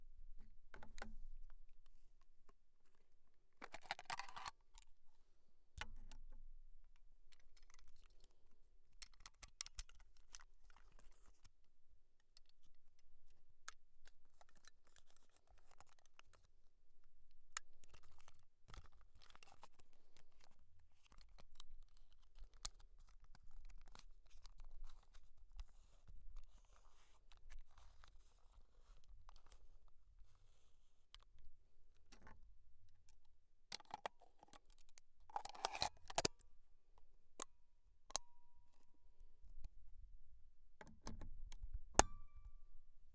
DEMONTAGE DOS CHARGEMENT FILM

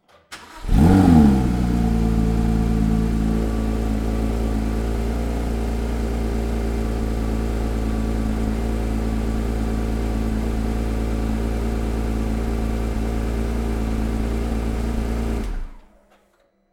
DEMARRAGE

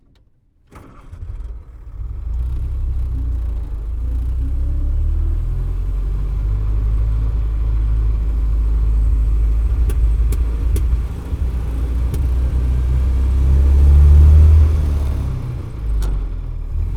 AMBIANCE INTERIEUR

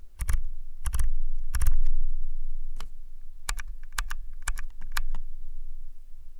ROULETTE SUPERIEURE

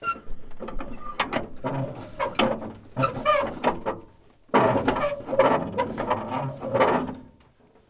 MANIVELLE

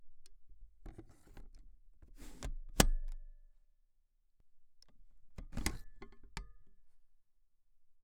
CHARGEMENT DECHARGEMENT

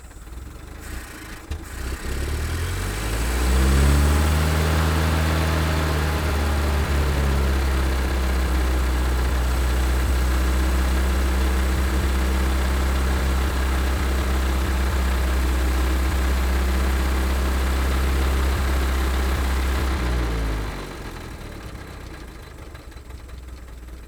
ACCELERATIONS

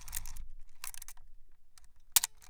ARMEMENT-DECLENCHEMENT

